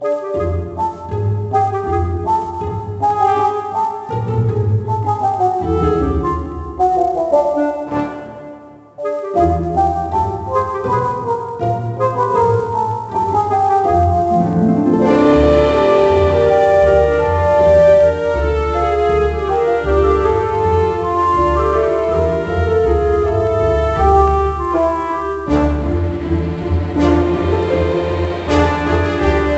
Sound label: Music Challenge; Monster Lab; NDS